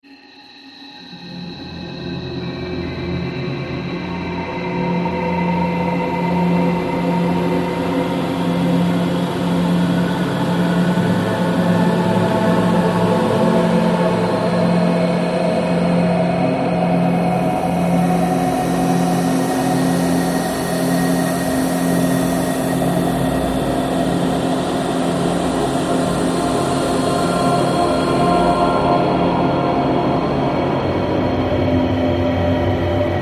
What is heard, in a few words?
Voices from Beyond eerie, heavy tones with distant vocal sweeps